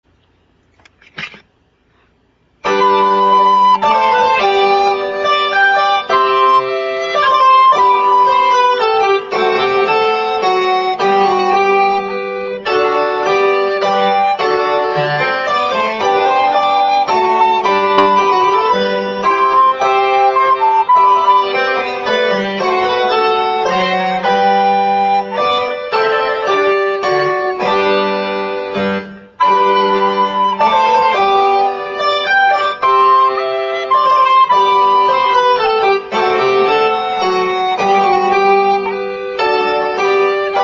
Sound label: Menuet Alto